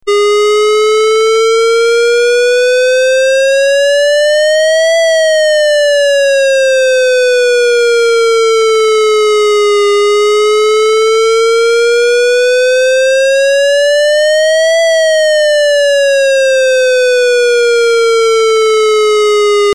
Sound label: shark alert